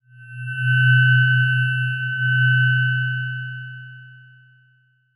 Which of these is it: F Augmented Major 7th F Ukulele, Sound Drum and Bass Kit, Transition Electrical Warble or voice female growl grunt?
Transition Electrical Warble